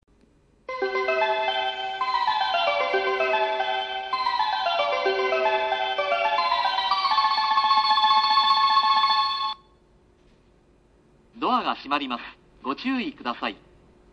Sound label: ushiku melody; jreast